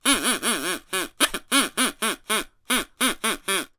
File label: cartoon duck quacks whistle